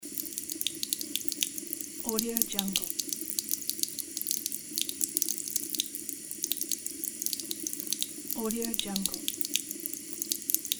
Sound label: water faucet trickling loop